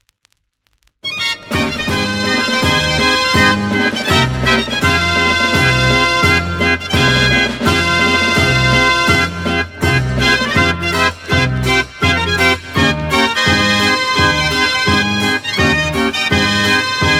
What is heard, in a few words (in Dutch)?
El condor Pasa, draaiorgel; De Veronica; Telstar parade